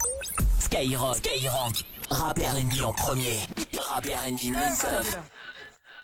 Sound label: JINGLE; RAP EN PREMIER 2013; SKYROCK